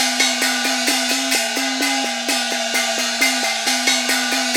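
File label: percussion 130 BPM, right; INIS - Opium; PERCS 130BPM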